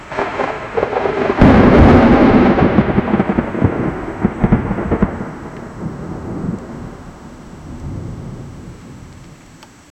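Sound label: a loud realistic lightning, strike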